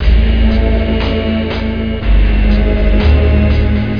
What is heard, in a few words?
eerie industrial